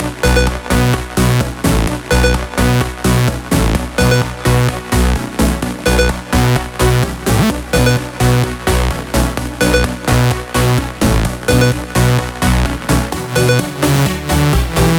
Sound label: Special Sounds Root B 128 BPM; Vengeance Samples; VEH3 Special Sounds